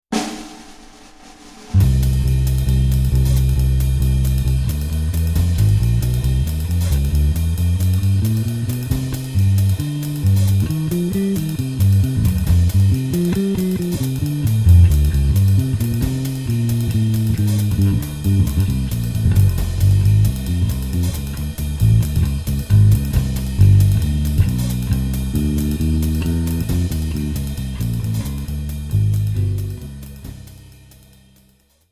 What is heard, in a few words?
Jazz